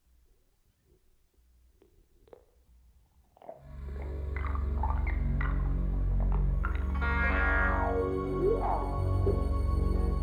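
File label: Instrumental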